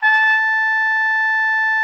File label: A5, TRUMPET; Xsample VOL-3